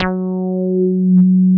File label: TB 303 BASS; BASS SOUNDS